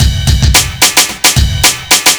block rock kit

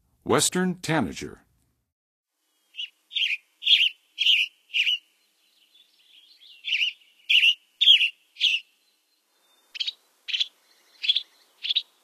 Western Tanager; North America; Western USA; Western USA Songbirds

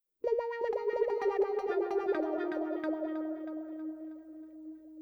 Guitar, Funk

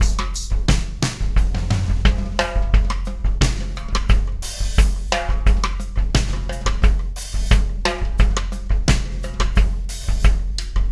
DRUM; DRUM SUGAR

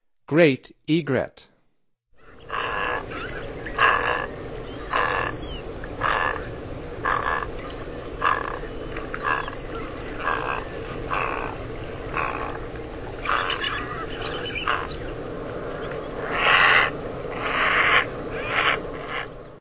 Great Egret; North America; Stokes

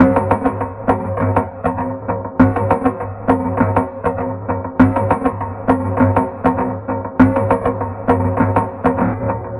Beat; Sound Effect Library; Electronica; Solo Beats

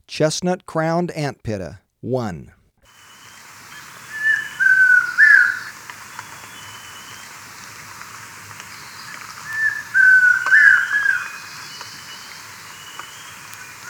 Chestnut-crowned Antpitta, Birds of Ecuador; S. America

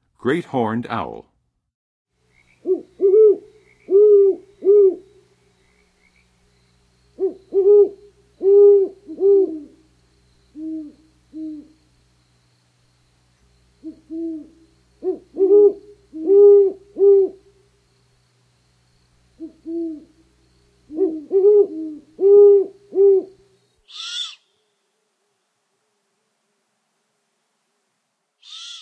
Great Horned Owl; North America; Western USA; Western USA Songbirds

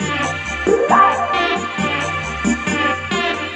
Synth, Techno

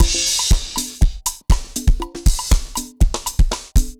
dancefloor perc 120 bpm

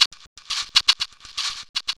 Matchbox Beat; Electronica; Solo Beats

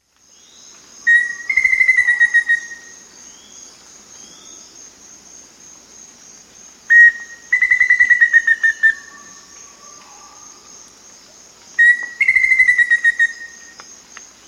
Black-Faced Antthrush; S. America

